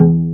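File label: double BASS E-1; AKAI S6000 CD-ROM - Ultimate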